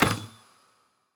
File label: roman ranged bow fire; smite; npc; roman archer